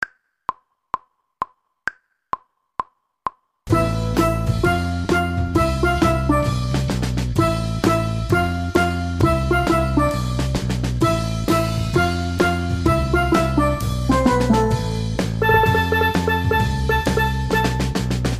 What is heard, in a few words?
steel pans; steel pans 2